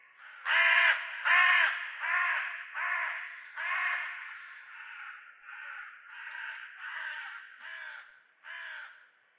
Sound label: crow; minecraft; Remastered; ambient